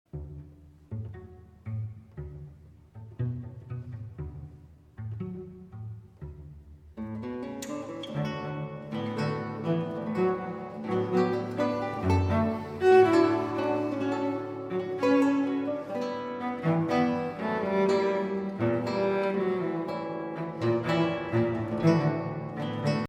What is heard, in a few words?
Cafe solo - Tango